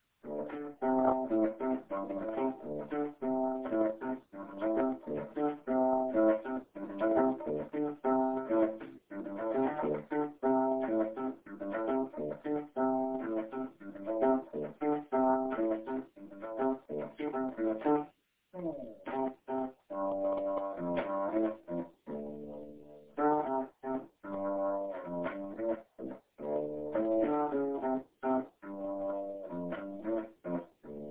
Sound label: funky